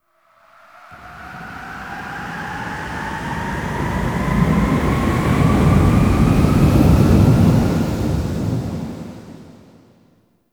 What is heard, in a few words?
Exterior Gust; Ambience; Empyrean; Warframe; Railjack